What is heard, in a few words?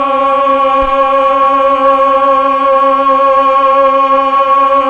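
voice Mellotron choir; Roland LCDP09 Keys of the 60s and 70s 1; Melotron Vox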